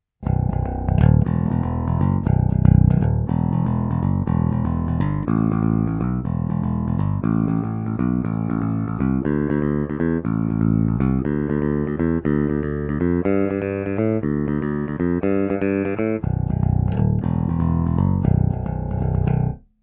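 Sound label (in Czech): Baskytara Schecter SLS Elite, pražcová, 5 strun, trsátko, Rotosound Swing66, Stainless steel, roundwound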